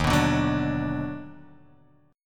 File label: Eb Minor Major 7th Sharp 5th Eb down, chord; EbmM7#5; strummed; JGuitar